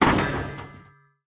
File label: Drop Metal Object